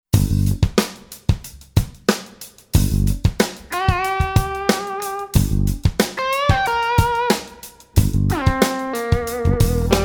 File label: funk